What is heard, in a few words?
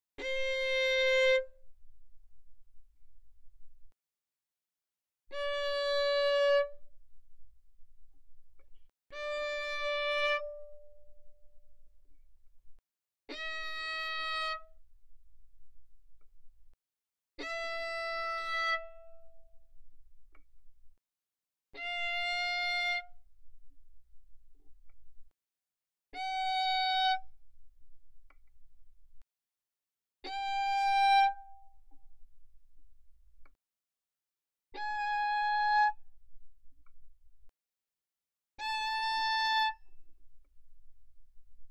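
Violin arco fortissimo sul D C5 B5; Strings; violin2012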